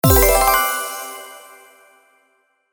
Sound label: Level Up